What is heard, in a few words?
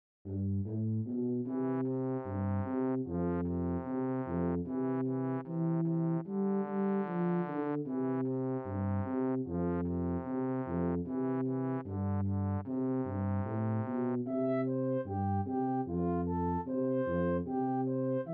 Big Ten Pack of Eight Bar Melodies